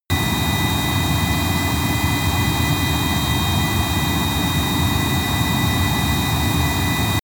military jet bomber turbine steady noise; Airplanes - Jets

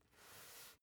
basketball turn swipe short

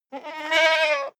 goat baa desperate male; natur tiere; ziegen

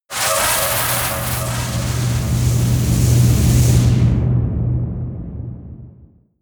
air screaming vortex; laerm

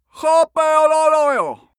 male shout arabian

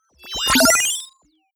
confirm sweep, signale; soundpool

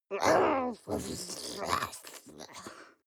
witch babble slobber; kreaturen

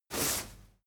crowd movement single bright; bewegung; soundpool

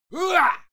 male fight attack hit; soundpool

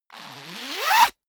zipper pullover jacket open accelerate; objekte; reissverschluss

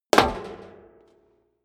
step high heels metal rattle jump; schritte

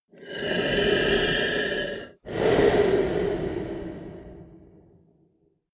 monster snore breath single; koerpergeraeusche; comedy-cartoon; soundpool

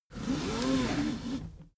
car seatbelt pull slow

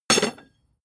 metal tools drop; soundpool

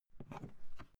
briefcase open; industrie wirtschaft; bueroarbeit

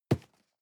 cardboard box medium grab hard; objekte

